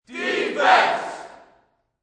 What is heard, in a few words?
Sports Crowd Chant Defense Single